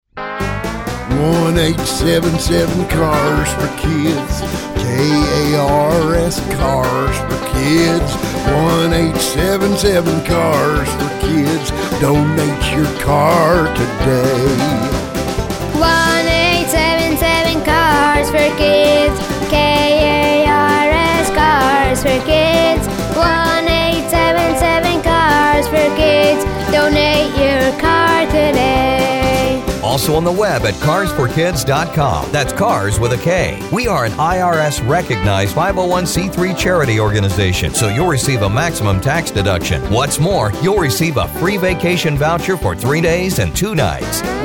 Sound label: bluegrass; WFAN